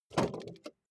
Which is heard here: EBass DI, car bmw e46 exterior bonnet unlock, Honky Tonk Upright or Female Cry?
car bmw e46 exterior bonnet unlock